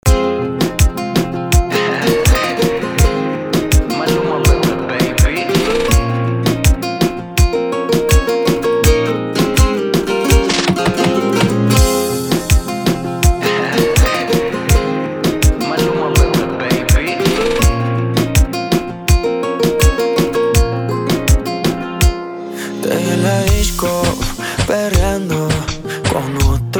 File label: Intro Outro 82 BPM